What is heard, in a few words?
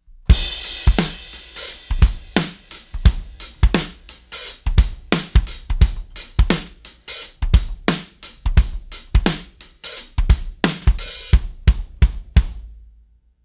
Live Drums